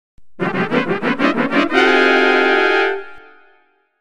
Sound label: fanfare